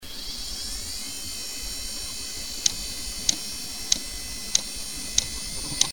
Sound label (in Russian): Stuk vinchesterov Samsung SV series vyzvannyy neispravnostyu bloka magnitnyh golovok